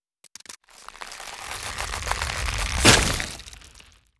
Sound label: Explode; Mars Sortie